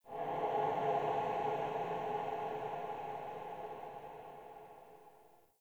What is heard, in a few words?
Hub Texture G, Ambience, Dungeons, Under City; Silent Rose